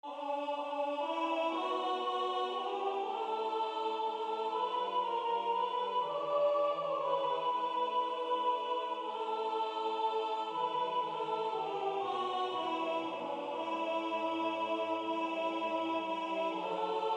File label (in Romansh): Sut steilas Sopran